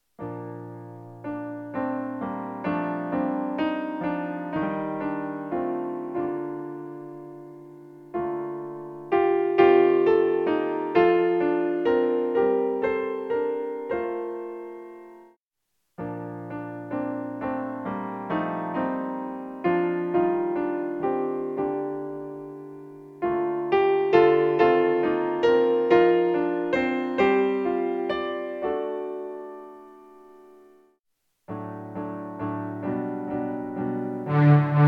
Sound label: BARITONE instrumental